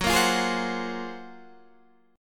Gb Major Sharp 11th, Guitar Standard; 14,13,15,13,13,14; strummed; capo=3

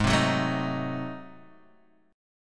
Ab 7th Flat 9th down Guitar Open D

